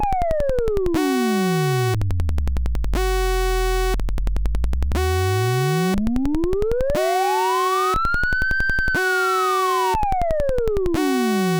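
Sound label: LFO square sine audio; dc test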